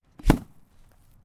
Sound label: Beating Hay Bale Broom Impact Hit; Foley; Foley Essentials; Foley - Misc